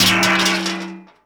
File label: Impact Metal Fall